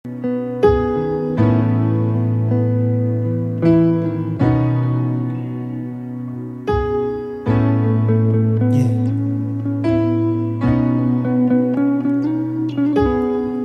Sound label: Acoustic